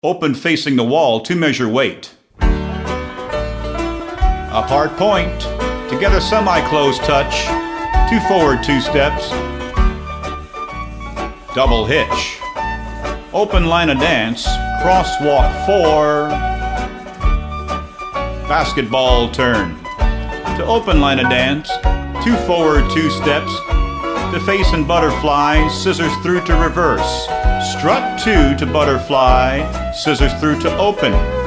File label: vocal sample